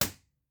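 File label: Live Room impulse response; Physics and Electronic Engineering Buildings; B-Format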